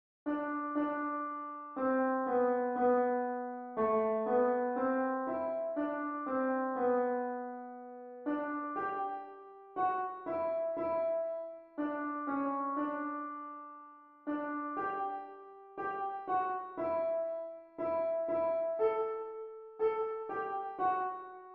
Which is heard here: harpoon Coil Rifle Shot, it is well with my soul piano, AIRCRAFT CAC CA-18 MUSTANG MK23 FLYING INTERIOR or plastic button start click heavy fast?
it is well with my soul piano